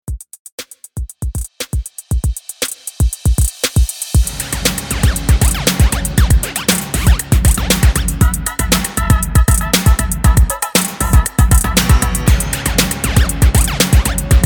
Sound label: 90s, 118 BPM; REDRUM